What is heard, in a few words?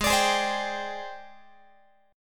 G sharp Major Sharp 11th, Guitar; G#M#11; x,16,17,18,16,18; strummed